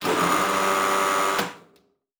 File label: servo big, sci-fi sounds, mechanical